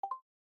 join; Feishu

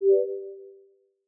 blow, accessibility signal; Trae CN

